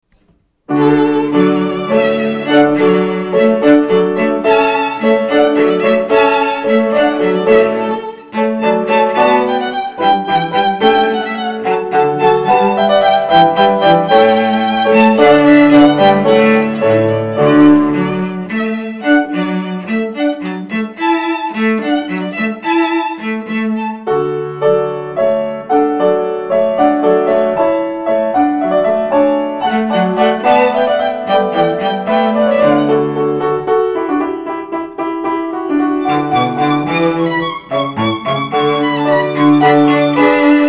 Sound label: Hornpipe in D trio